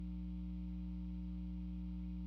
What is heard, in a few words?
GTR